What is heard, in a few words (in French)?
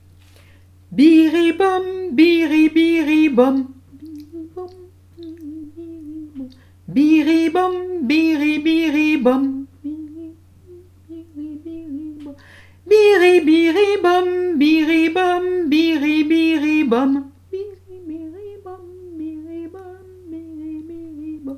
apprentissage progressif en imitation chante